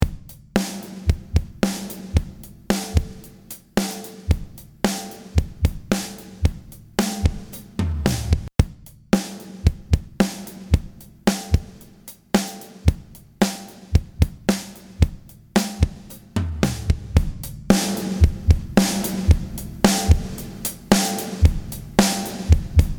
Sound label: kick snare add bus compr